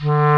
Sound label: D3 CLARINET; ORCH.COMP